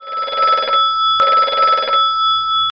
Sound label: short rings